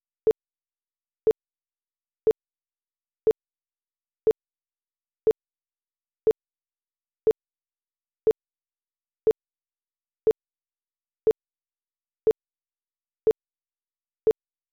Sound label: quarter, clock